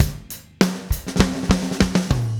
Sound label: Drums, Rock